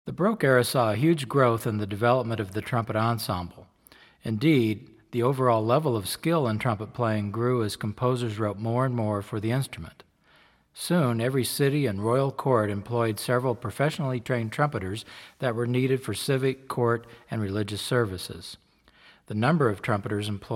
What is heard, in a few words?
Clarino and Principale styles of trumpet playing